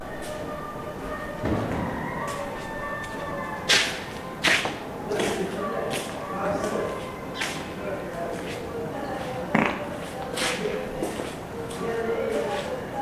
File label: Passing footsteps passing wind